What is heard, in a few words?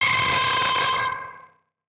ghost